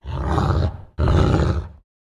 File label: Wolf aggressive growling